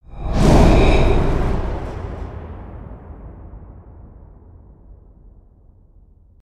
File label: Wind Leap, ambience spaceship; Starbase